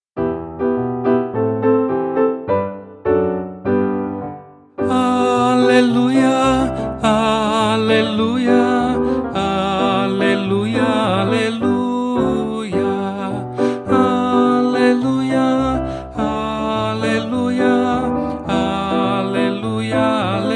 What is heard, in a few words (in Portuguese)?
aleluia aclamacao; solenidade da assuncao da virgem maria 2021 ano b; aleluia maria e elevada ao ceu